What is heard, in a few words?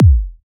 Simple Kick Drum